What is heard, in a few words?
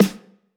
hiphop snare, Effect on